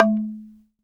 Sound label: Bamboo; Shinachiku; CMI